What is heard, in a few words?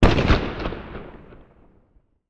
Explosion Distant Cannon Fire Small; Fire and Explosions